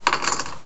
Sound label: add counter